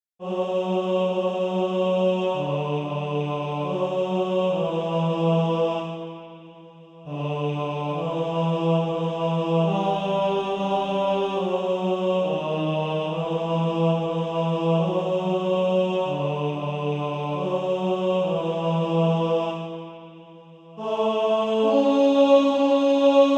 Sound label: Male Choir; Bass